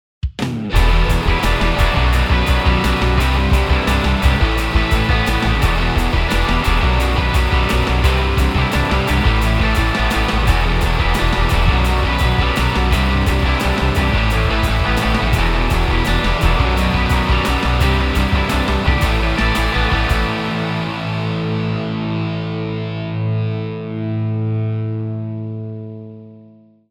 riffi

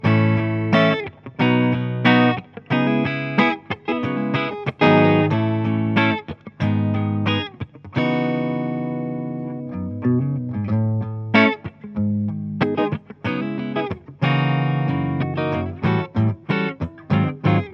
clean 335 kaula; dsl401

